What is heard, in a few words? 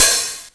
Cymbals Open HH; Vengeance Samples